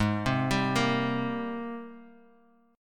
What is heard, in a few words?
Ab Major 9th Ab, Guitar Open G; 6,5,5,3,x,x; down